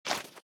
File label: step